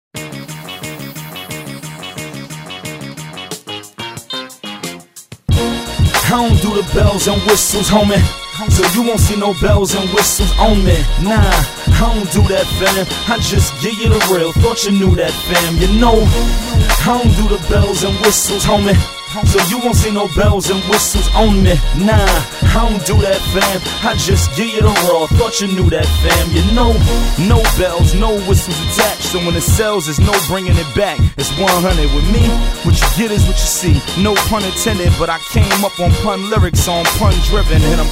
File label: bells whistles